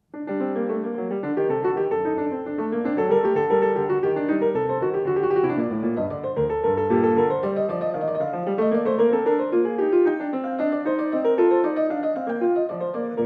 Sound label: English Suite No 3 in G minor, Courante; Bach; BWV 808